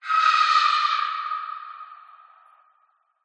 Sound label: scream shriek descend sound design reverb mournful; skyclad sound